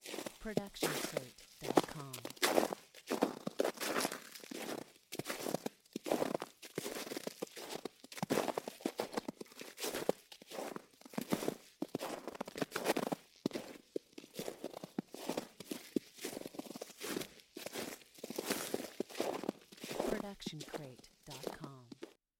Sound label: Snow Walking; SoundsCrate